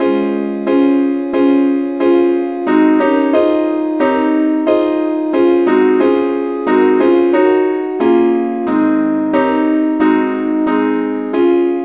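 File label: advanced lead key D flat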